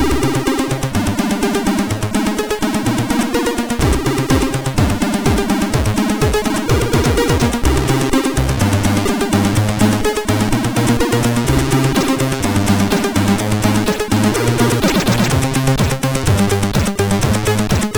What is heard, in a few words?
sid MOS6581